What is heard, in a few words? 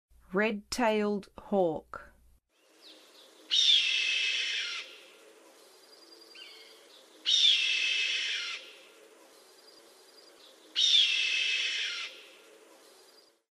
Red-tailed Hawk, Songs of Birds of North America; Western USA; Western USA Songbirds